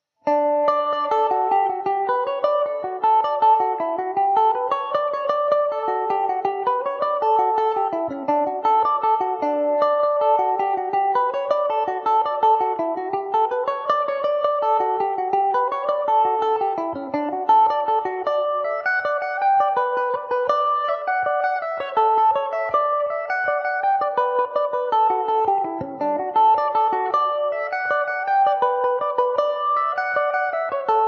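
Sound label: D major mandobird, irish mandolin; Benedict’s Rambles; Epiphone Mandobird VIII electric mandolin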